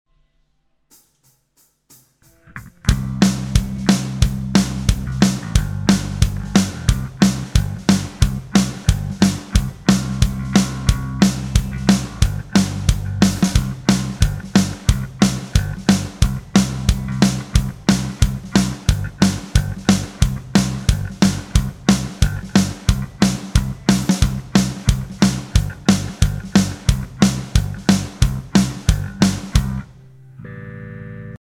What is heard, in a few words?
Backing Track; country guitar mastery